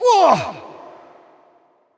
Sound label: Wound; Bolvar Fordragon